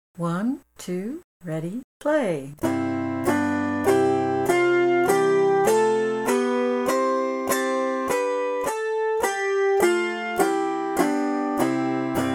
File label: Scale and Arpeggio Exercises